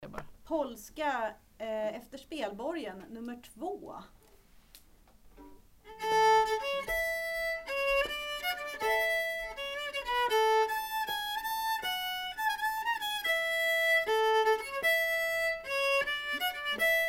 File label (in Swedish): Polska